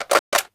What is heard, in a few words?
f2000 mag in; garrysmod